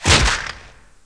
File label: squash